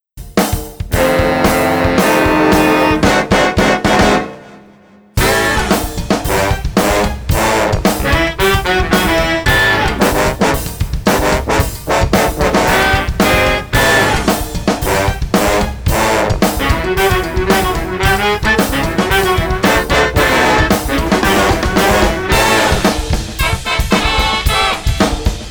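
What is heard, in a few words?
Street NOLA Funky Brigade, 112 bpm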